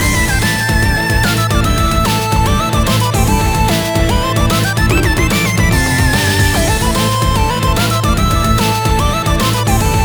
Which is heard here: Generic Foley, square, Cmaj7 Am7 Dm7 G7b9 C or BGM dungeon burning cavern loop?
BGM dungeon burning cavern loop